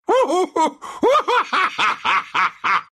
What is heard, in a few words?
laugh ru; Tusk